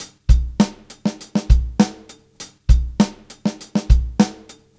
Live Beats, R&B Drums

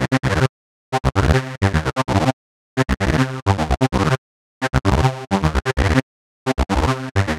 130 Cm synth, Synth Loops, Trance; Trance Explosion Vol1

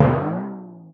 timp; musicradar; cartoon caper

